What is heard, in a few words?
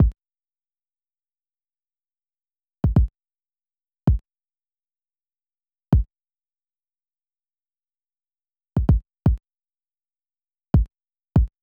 162, D, kick; VALVES & VIBES